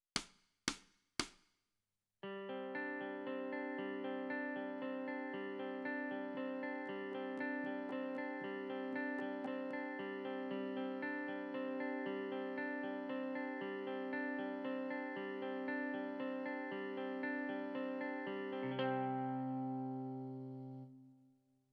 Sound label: Guitar Right